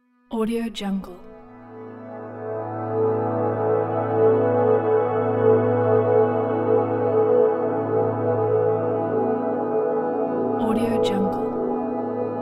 relax soundscape background ambient

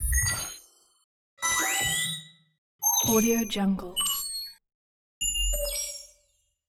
future weapons source sounds sensors; future weapons 3; soundmorph

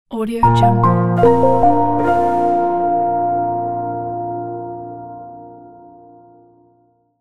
soft piano logo

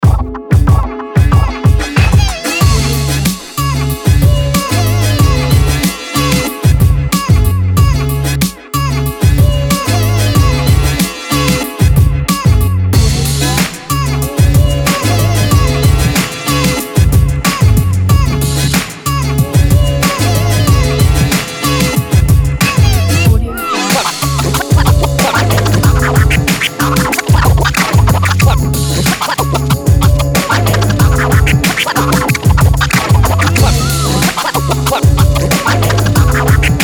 hip hop; soundsalad

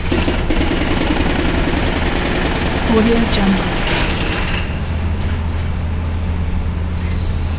jackhammer loop; debsound

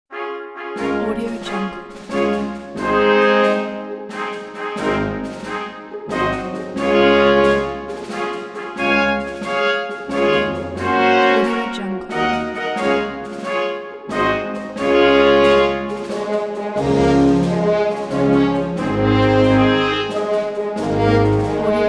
happy birthday to you orchestral fanfare brass

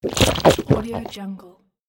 a drink and swallow

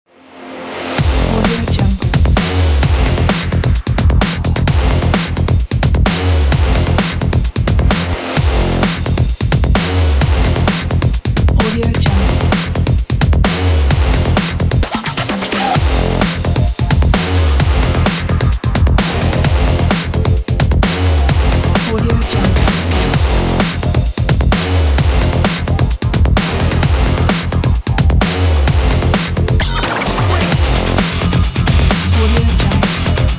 breakbeat; intelligentmusic

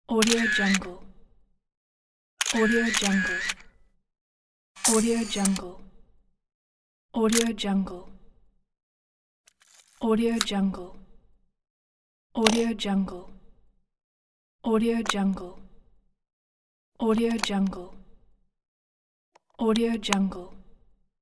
camera sound effects

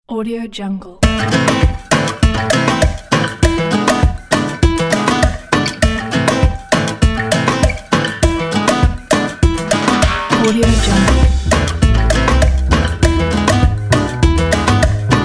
hot sands

